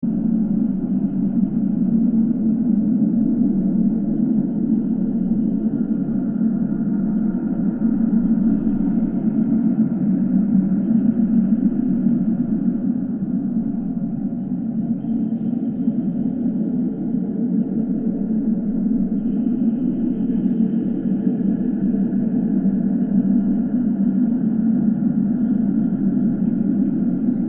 Diffuse Space Ambience With Alien Noises 120bpm

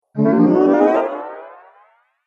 Amazing Magic Make Bigger; Magic Sound Effects

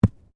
Bodymovement Ground Impact Heavy; Body movement foley to simulate falls and deaths; Wrestling And Struggling

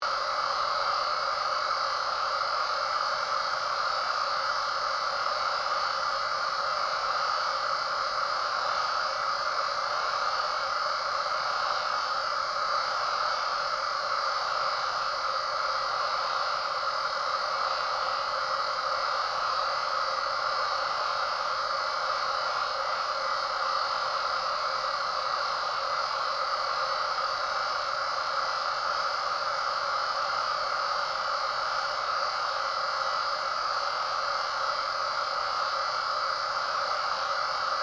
Radiation Stream, Scifi; Science, Fiction; Spacecrafts

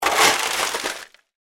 Stones Shovel, Industry, Stones, Shovel, Scoop; Yard Tools

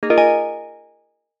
chime arpeggio light; Multimedia; Chimes; Blastwave

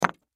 Impact Brick Piece Drop; Drops; Sound Effects:Objects; Blastwave

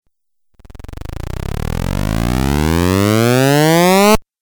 Rising Video Game

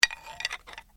Bones Human Move; Material components for constructing more complex sounds; Tapping (Sound Effects:Objects)

